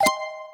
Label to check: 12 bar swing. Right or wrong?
Wrong